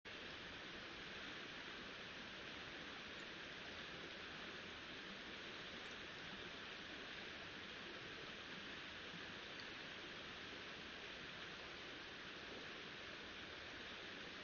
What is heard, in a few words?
riverside; Kyoto U; Ashiu